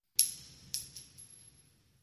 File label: Shell Casings Single